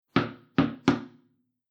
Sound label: Tennis 2 Bounces 1 Hit